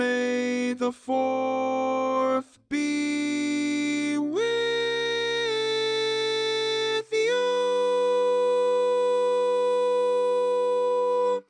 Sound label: May the Fourth Be With You, Bari, barbershop tags